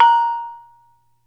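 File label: METALOPHONE, Pitched Percussion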